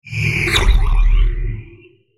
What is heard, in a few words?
Sci Fi Weapon, Sci Fi Plasma Ray Gun Blast Impact Warble Liquid; Battle; Sci Fi Weapon Plasma Ray Gun Blast Impact Warble Liquid Science Fiction